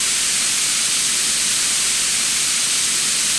Steam General Loop

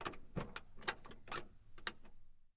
Camaro Door Open Ligh, chevy camaro

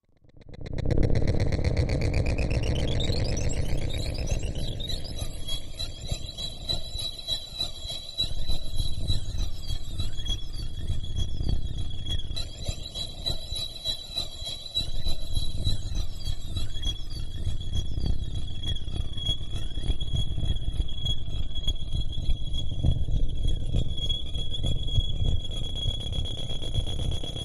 Rattle Comb Machine, sci fi ambience

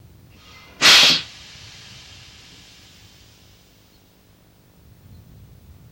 Air Brake Diesel Truc; brakes

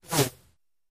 Arrow Whistle, bow & arrow

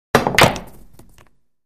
Billiards Cue Ball O

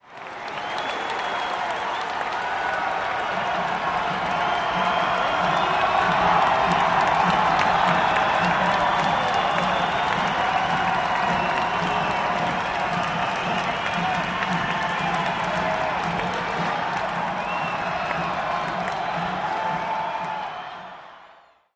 gym crowd cheer, cheers

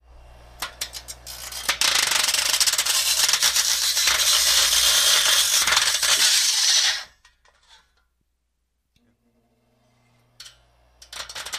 Elect Grinder Grindi, grinders

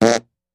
Dry Fart; farts

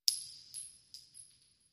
Shell Case Sngle Revb, shell casing movement

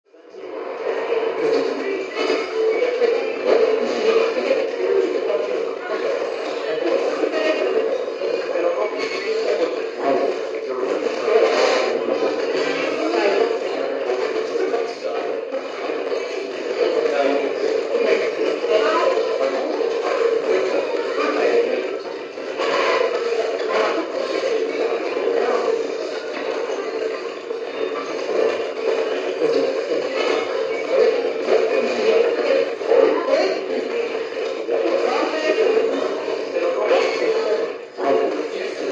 Eerie Radio Static

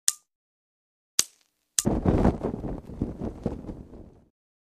Flame Lighter Click; fireballs

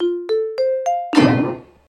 Boink Bonk Ascending

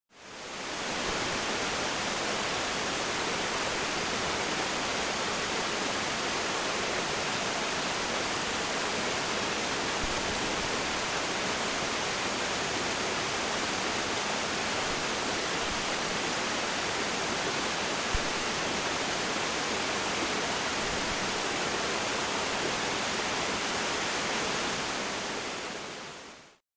Waterfall Medium

